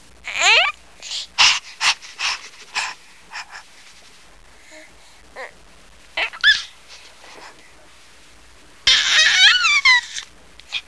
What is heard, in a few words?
BABY GOO; persone